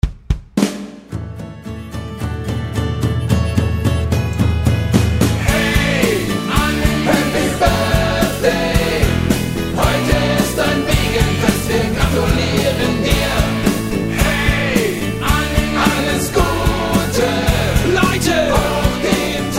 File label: Happy Birthday Annelies